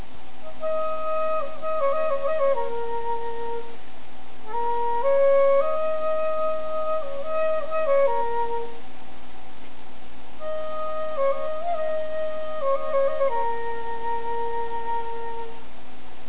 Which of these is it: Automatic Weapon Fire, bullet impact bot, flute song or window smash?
flute song